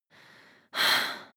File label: breath female mouth in out